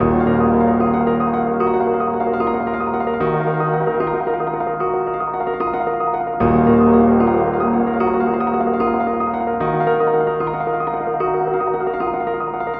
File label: Piano D#m 150, Trap; HipHop; Melody Kits; Loops; Ghosthack; Creeps 150 D#m